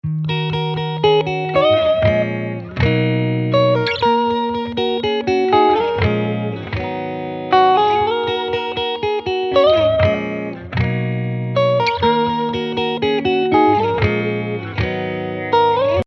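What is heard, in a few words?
E flat minor 120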